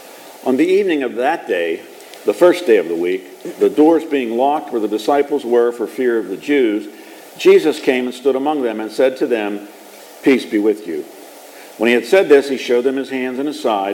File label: Gospel